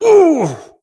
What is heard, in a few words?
Horseman Wound Crit; svencoop; keenhalloween; horsemen